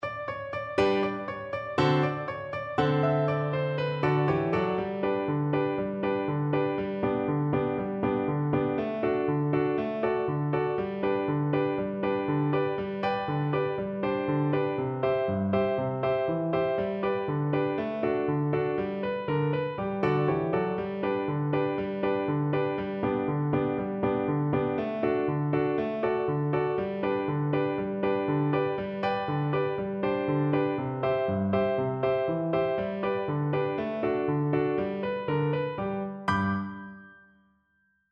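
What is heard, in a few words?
viola karaoke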